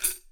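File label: PERCUSSION TAMBOURINE; Sonic Reality; Interactive Drum Kits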